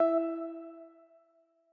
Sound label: Quasar Move E; Mixolumia